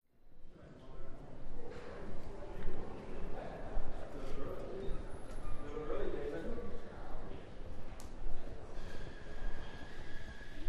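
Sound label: Building Hallway Ambience, lobbys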